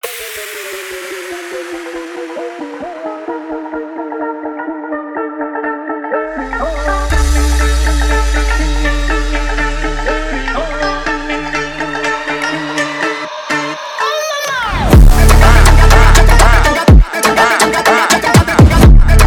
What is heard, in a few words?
indian phonk